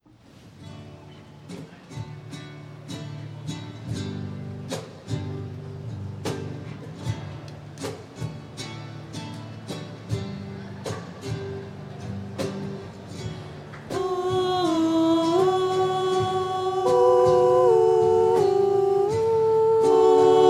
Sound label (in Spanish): voces; misa